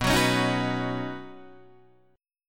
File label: B Major Add 9th B Guitar Open D